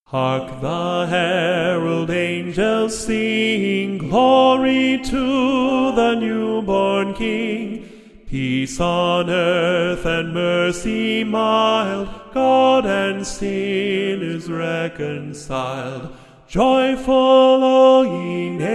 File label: hark soprano